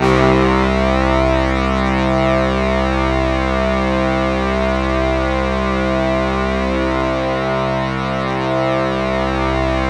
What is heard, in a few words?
C2, Synth Line; 90 s Sample CDs; NiceBeats; SynthLine VOL-26